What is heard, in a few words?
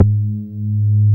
BAS MINI, Mini-Moog